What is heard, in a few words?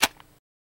Zippo Lighter Light; lighters & matches